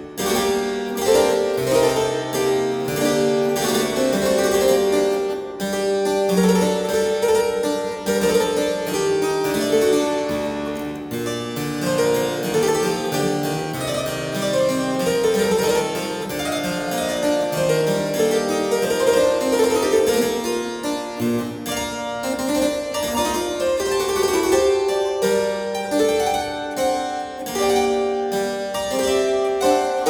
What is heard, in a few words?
Gavotte; Rameau